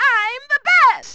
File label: big attack; sven; player; toad